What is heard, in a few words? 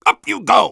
flip exert; Soldier; taunt